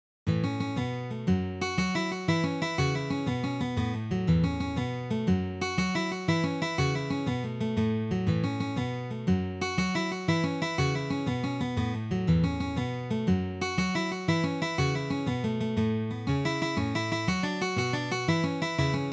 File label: Open Door, Jigs